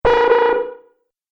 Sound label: Multimedia Error, beep; Beep Sound Effects